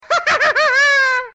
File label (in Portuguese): risada; flapjacks laugh